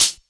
clear hi hat a sharp key, pedal hi-hat, Loudest frequency: 6366Hz